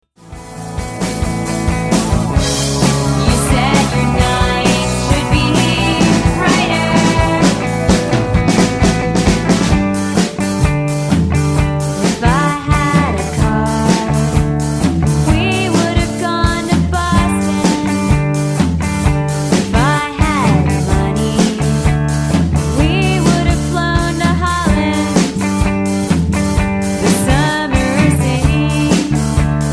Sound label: drum